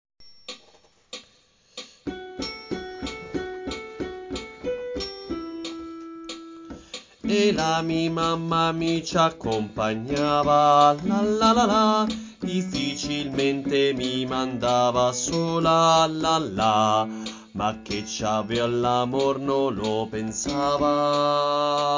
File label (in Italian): Bassi Toccami Cecco